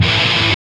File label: GTR; Roland - LA Composer vol. 1